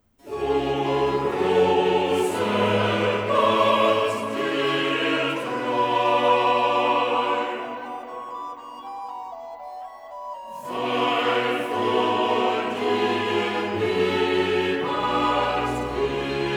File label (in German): Cantata, Choral; BWV 46 'Schauet doch und sehet, ob irgendein Schmerz sei'; 'O großer Gott; Bach; Braunschweig; 10th Sun After Trinity